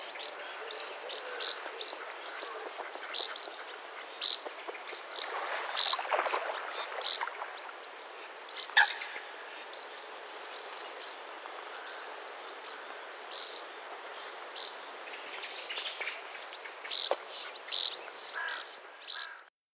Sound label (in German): Mehlschwalbe Flugruf